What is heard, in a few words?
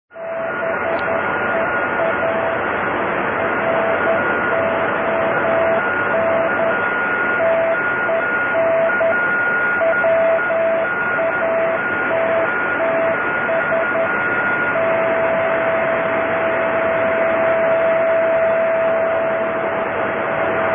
beacon